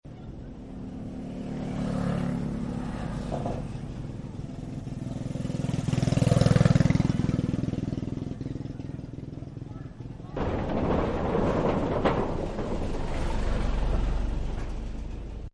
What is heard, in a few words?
Traffic Bridge Wood; Traffic and Cars; Sound Effects:Backgrounds